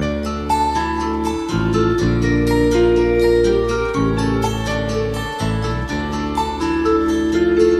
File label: keys, jazz loops